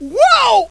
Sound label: shout